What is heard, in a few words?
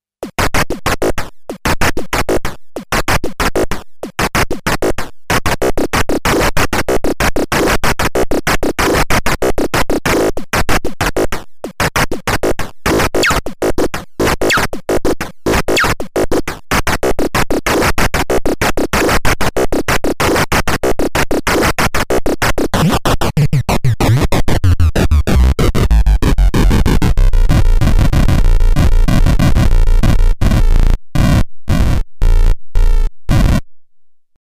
hss percusion, hard soft synth